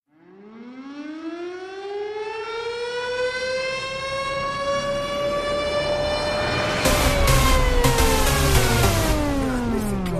Music Ringtone

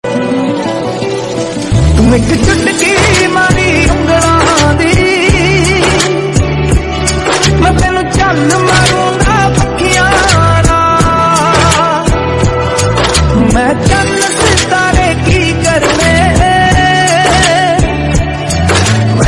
Romantic Punjabi

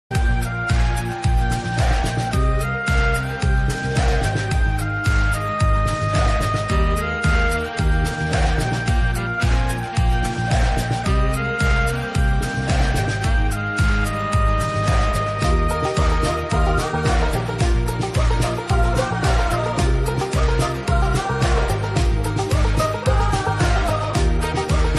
Ringtone Instrumental

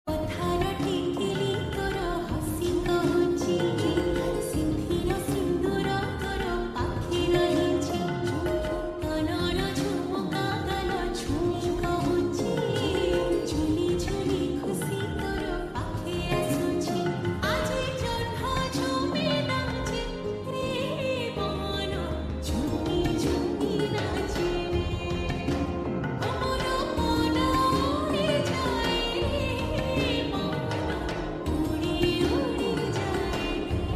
Odia Ringtone

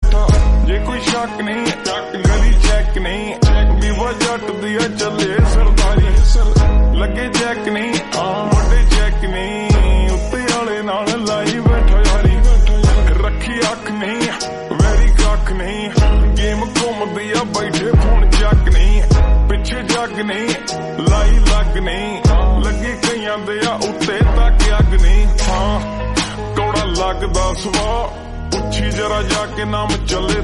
Lofi Punjabi Song; ringtones

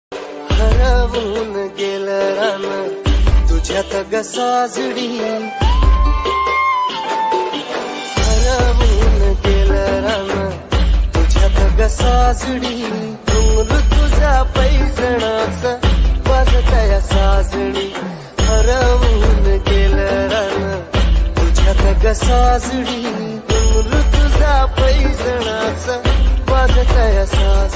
Marathi Ringtone

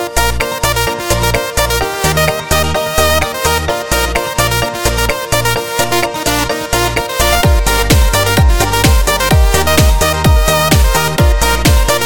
Ringtone for Android Mobile Phone

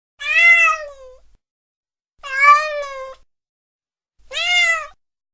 Cat Voice Ringtone; ringtones

